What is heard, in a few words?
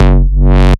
Bass Shot F#